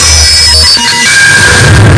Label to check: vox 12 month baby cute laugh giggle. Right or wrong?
Wrong